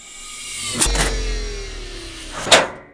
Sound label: hoover; allegiance